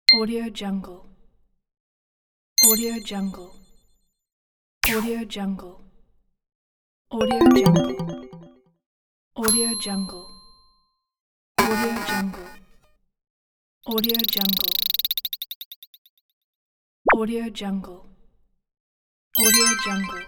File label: infographic user interface